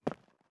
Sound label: Footstep Concrete Walking